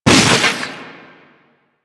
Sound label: Proto Infested Runner Arm Attack Hit; Warframe; Abilities